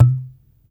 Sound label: TABLA LOHIT; Spectrasonics - Heart of Asia CD2